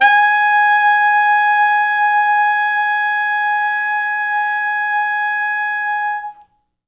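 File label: T Sax ext. range, tenor sax; G#4; East West; Quantum Leap Horns Sax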